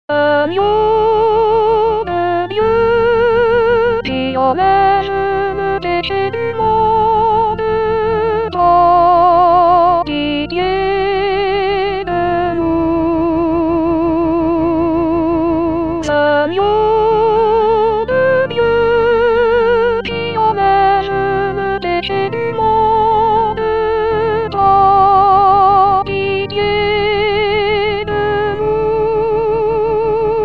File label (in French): Agneau De Dieu Soprano; Messe De L Agnus